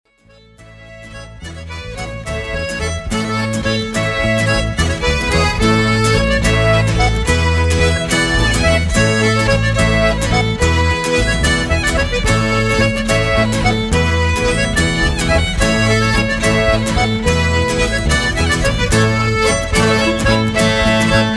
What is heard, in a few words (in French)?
suite bourree 3t